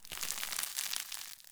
close crumble; Alley; Ambience; Dungeons; Under City

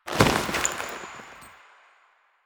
Foley; Finisher; Warframe; Kuva Lich; Finishers

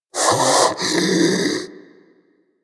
Armadillo Vocal Breathe; Characters; Warframe; Hunting Critters

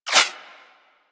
Laser Whizzby; Warframe; Weapons

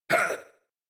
Pain, en; Warframe; New War; Teshin

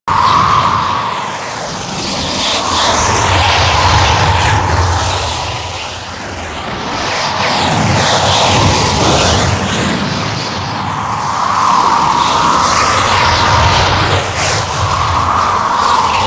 Void Storm Pillar Loop; Warframe; Railjack

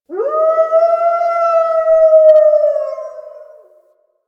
Wolf Howl; Animals; Warframe; Quests; Duviri

